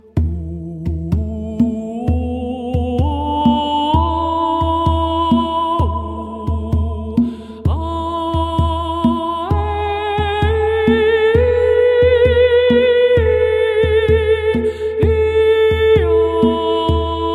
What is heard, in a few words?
Chakra Mantra